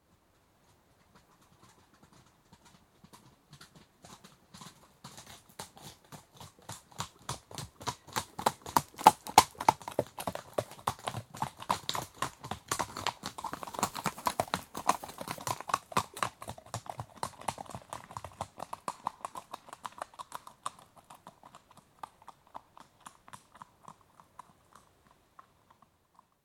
Two horses canter on approach, one trots on, other skitters on mic then canters away, right to left; Horses Cantering; BBC